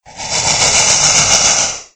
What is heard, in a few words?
breath; doom3; archvile